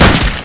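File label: super shotgun; DOOM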